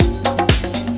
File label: PARTY PERCUSSION